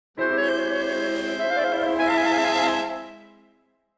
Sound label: Comedically Suspenseful Sitcom or Animation Transition